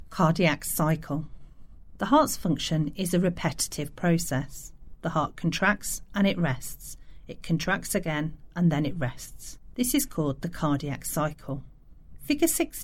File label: Cardiac cycle, heart